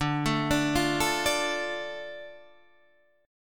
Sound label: D Minor D Guitar Half Step Down